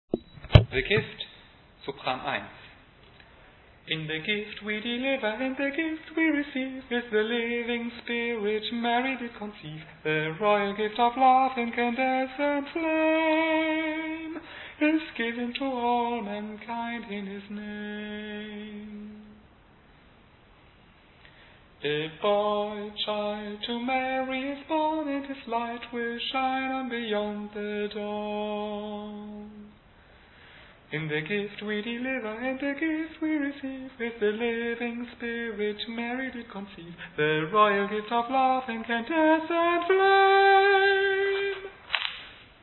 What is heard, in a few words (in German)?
Sopran